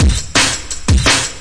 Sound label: 170 tekstep distorto; 161 to 180 bpm